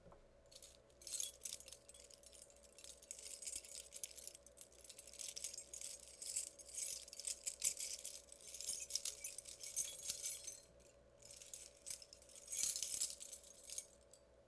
Fumbling with keys

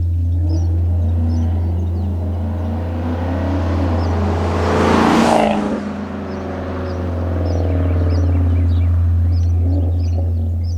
Car Engine Maserati Granturismo Drive By

